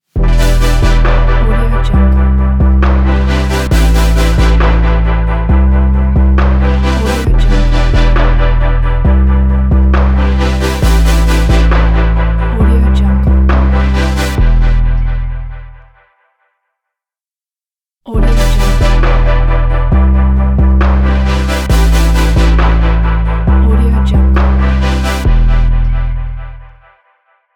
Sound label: tech ambient logo